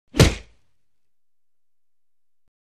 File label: Punch Impact, impact body